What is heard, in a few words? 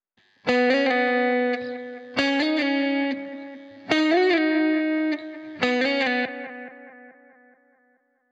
140 BPM G# Min Lead Guitar; Reminders